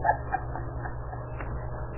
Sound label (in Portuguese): risada